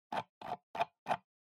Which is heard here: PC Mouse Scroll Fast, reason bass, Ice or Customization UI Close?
PC Mouse Scroll Fast